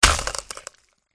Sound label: attack contact middle; zorb